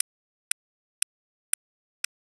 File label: Burst ref inphase